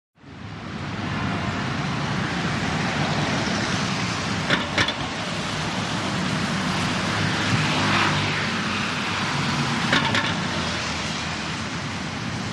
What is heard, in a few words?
Cars Drive Manhole, big city